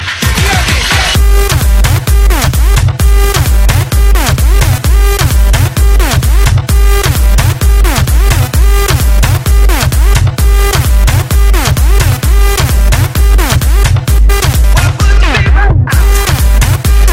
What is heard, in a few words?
Dirty Electro; Dirty Electro 16